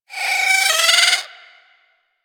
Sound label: Avichaea Vocal Idle; Warframe; Hunting Critters